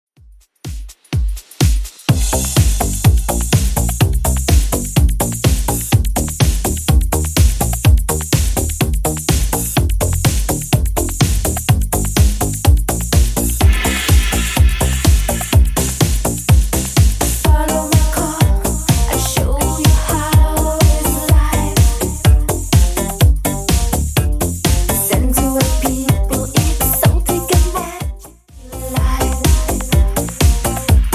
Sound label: 80s Redrum 125bpm